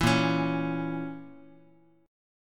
A sharp Diminished A sharp, Guitar; strummed

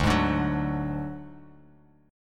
D Major Flat 5th D, chord; strummed; JGuitar

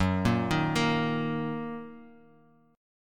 Fsharp 6th down Guitar Open G

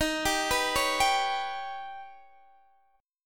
Eb Augmented 7th Eb, Guitar DADGAD; down

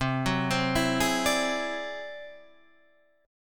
D 9th Flat 5th C, guitar

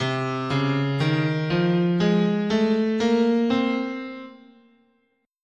C Symmetrical, scale

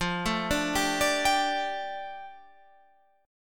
F 6th Add 9th F down Guitar Half Step Down, chord

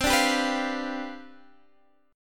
C Major 7th Suspended 2nd Suspended 4th C, chord

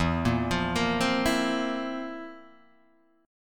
F Major 7th Suspended 4th E

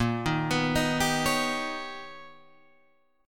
B Augmented Major 9th A sharp, chord

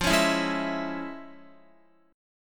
F Minor Major 7th Sharp 5th Guitar; FmM7#5; strummed; x,8,11,9,8,11

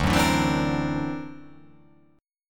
D Augmented 9th down, guitar

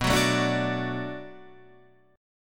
D Major B, guitar; D/B strummed

